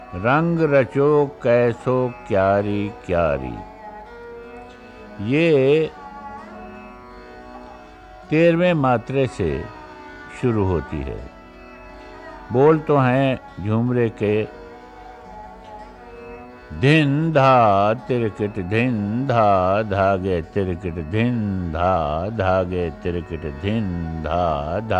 Rag Mian Ki Malhar

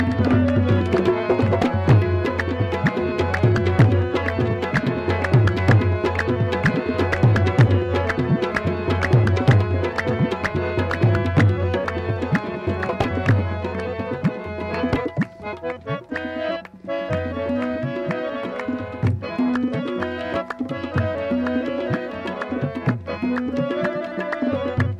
Instrumental

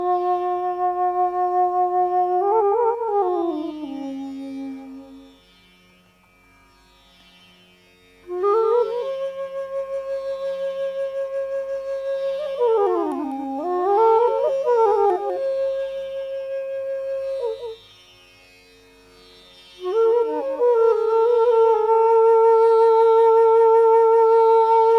Raag Pahari - Flute

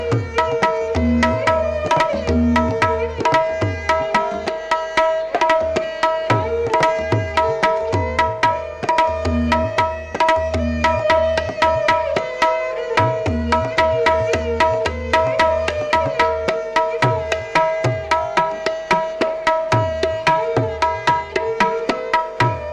Jai Taal - Tabla

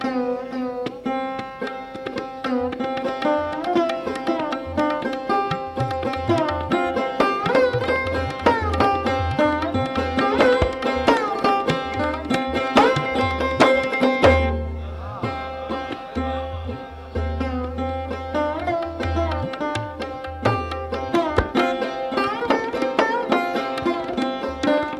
Raag Tilak Kamod - Sarod

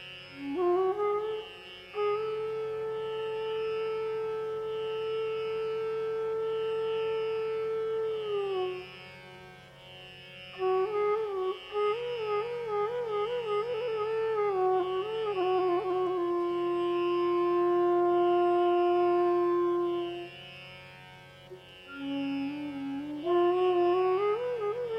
Raag Peelu, flute; classical raags on flute

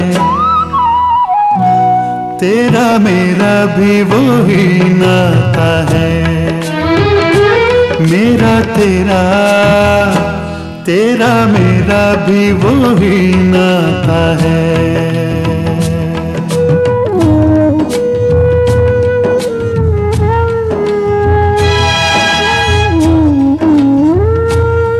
male; junoon; intiqam hum lenge

